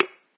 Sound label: button; Subway Surfers